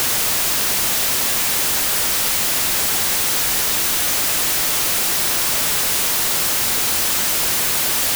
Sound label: Pre Patch Noise, rain